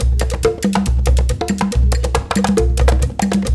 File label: 138, Lead Drm, Gyana HiLife